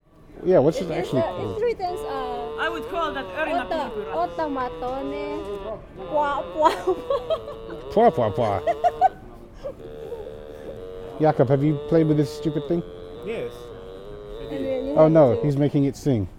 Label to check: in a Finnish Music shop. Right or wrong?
Right